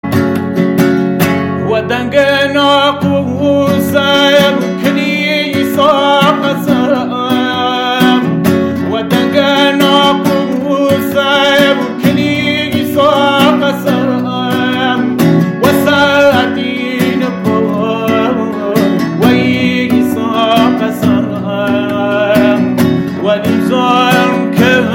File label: kordi live voice